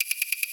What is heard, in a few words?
calculation; amazon-city-of-gold